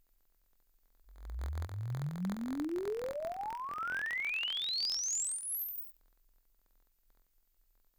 Loudspeaker uncal sweep

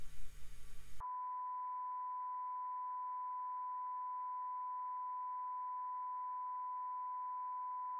Sin calib rec; Alesis inside

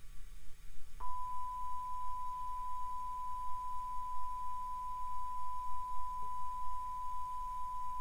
Sin calib; Alesis inside

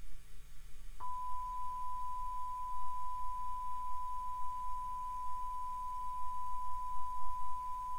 Sin calib; Alesis inside

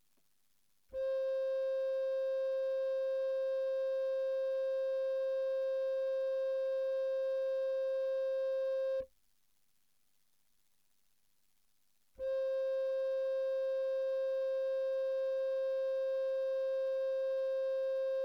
recorder C5 110Pa

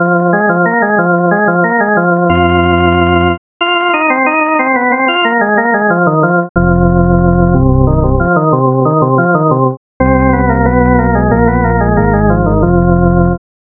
Hammond sample

